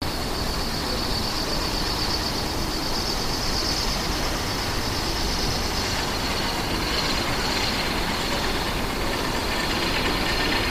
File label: Cable Car SF Pass Over, cable cars